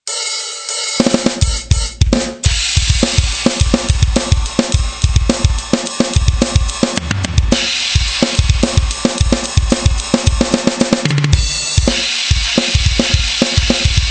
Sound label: bd sound